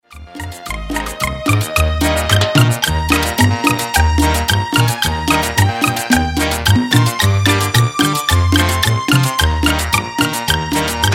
afro sound

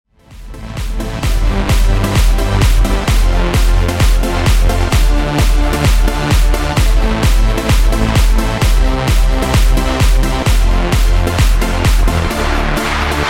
trend edm

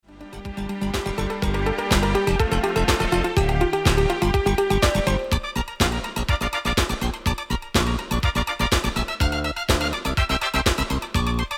dub, song

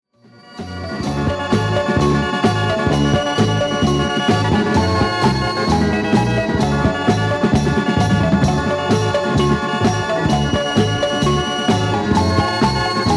melodys

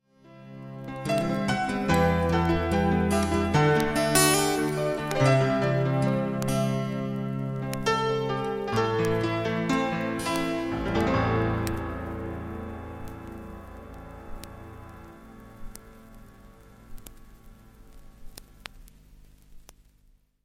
unplugged

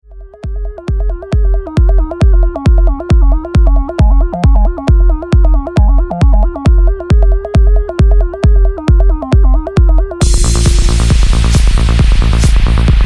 kit

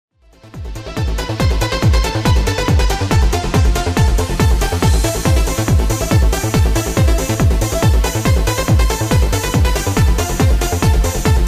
electrofunk

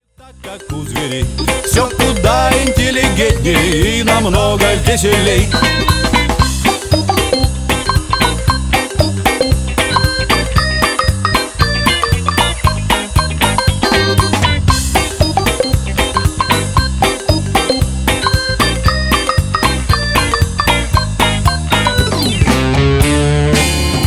drummers

